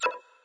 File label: SFX UI Click; Shuimufeifanjing; Android